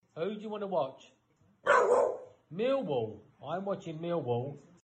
millwall dog

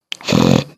snort

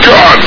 goofy jump sound

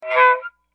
sax sound effect

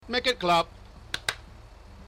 clap

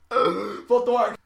fart

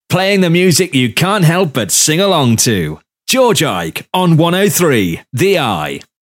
sing along dry

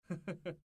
chuckle

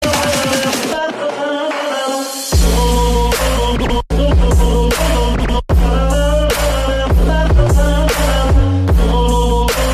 remix bass boosted

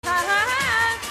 hahahaaa

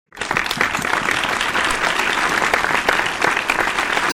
applause

